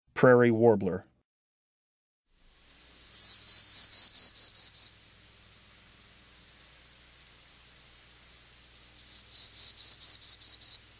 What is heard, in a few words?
Prairie Warbler; Breeding Birds of the Chicago Region